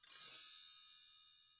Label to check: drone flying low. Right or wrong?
Wrong